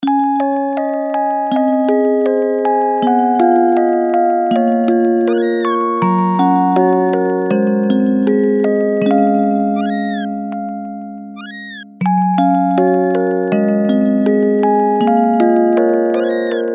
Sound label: Chiptune; chrono trigger style